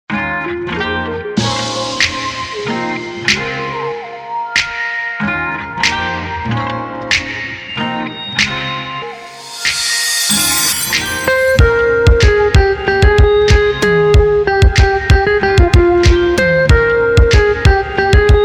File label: Instrumental Tone